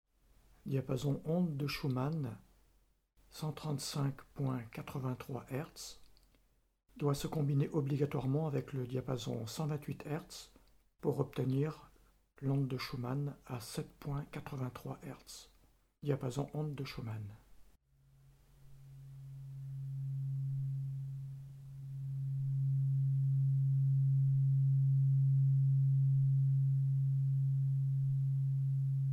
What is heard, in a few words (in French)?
diapason onde de schumann seul